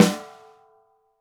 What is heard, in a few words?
Snare, Roland TD-20